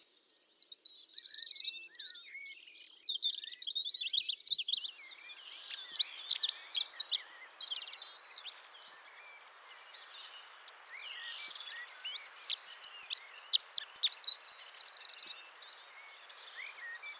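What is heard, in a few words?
dunnock voice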